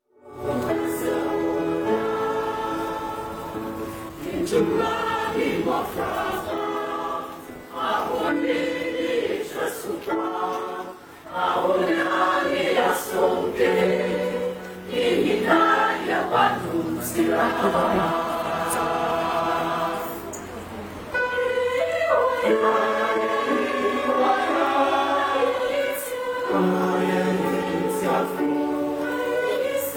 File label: choir